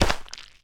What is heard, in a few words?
step; block